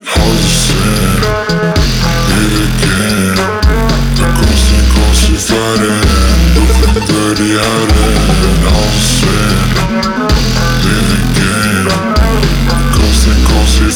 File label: drop pitched down